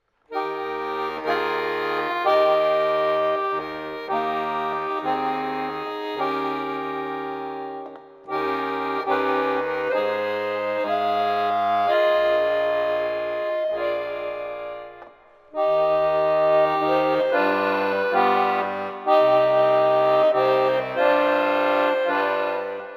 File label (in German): Neujahrslied Arrangement fuer Akkordeon